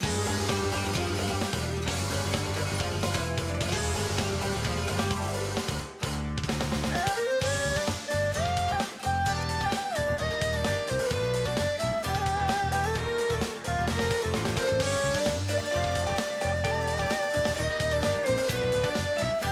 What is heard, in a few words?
Battle; Vellumental Area